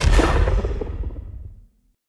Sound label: Great Sword Range Rune Smite